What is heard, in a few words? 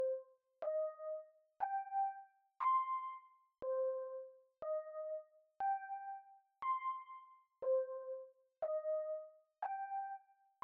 midi playback